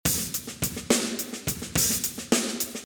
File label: drum; wahl